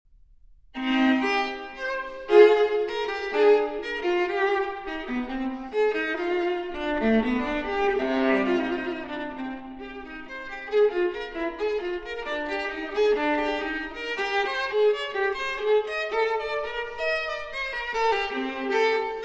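Cello Suite No. 5 in C Minor Transcription For Viola Gavotte I-II; J.S. Bach; BWV 1011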